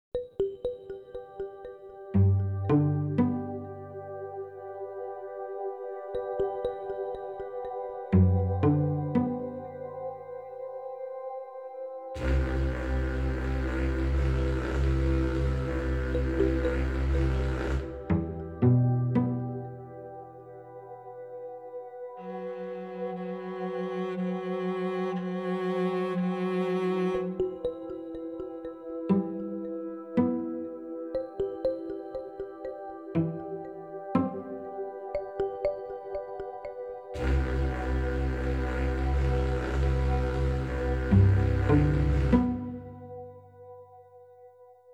music rings

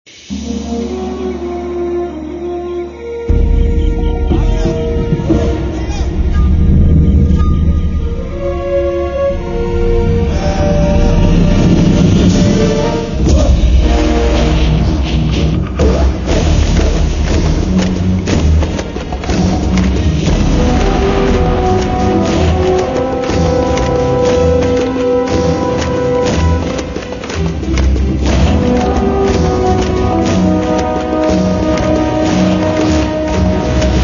menu

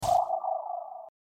charging; adventurecat; peacefree

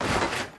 building click

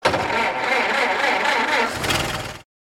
truck engine off; coolmathgames

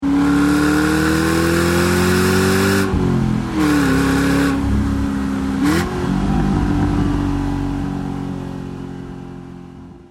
mustang shift; fordmustang

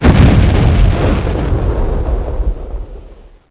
explosion long; battle; cross-code

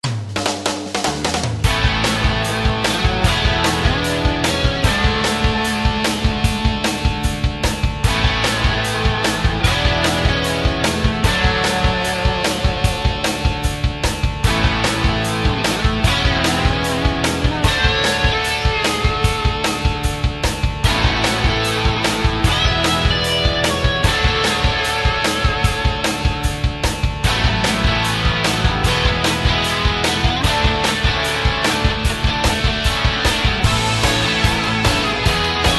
music

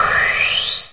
level up; doublespeakgames

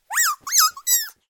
bunny happy; easter

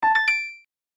defeat SE

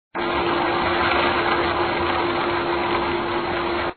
Sound Feed Go; coolmathgames

